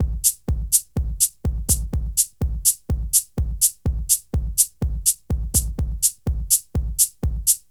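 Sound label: drum loops, left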